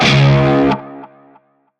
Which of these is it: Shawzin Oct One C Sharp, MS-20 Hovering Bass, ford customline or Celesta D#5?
Shawzin Oct One C Sharp